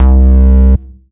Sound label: NINETY BASS C3; Best Service Dream Experience; NINETYS BASS